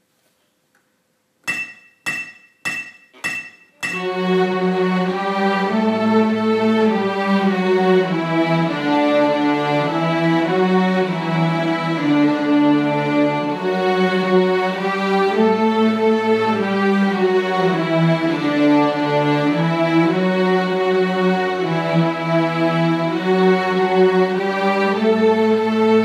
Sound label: brotherhood no metronome